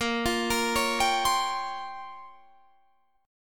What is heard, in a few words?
A sharp Minor 6th Add 9th A sharp down Guitar Standard, chord; arpeggiated